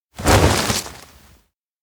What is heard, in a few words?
cliff jump; anemoi